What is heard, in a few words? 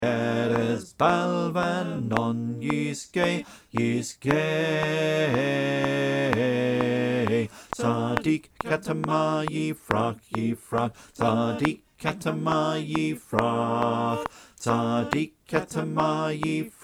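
Bass clicktrack, choir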